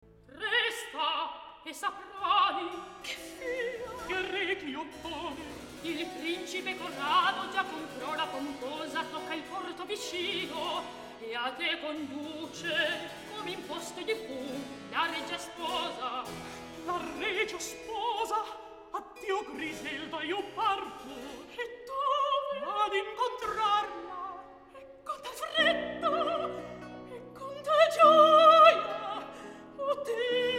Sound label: recitativo; alessandro scarlatti; scena 3; ottone griselda gualtiero